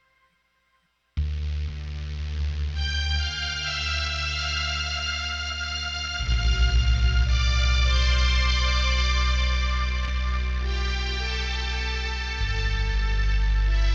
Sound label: Crumarian Rhythms